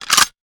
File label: weapon foley drop; Real Guns Sound Pack 2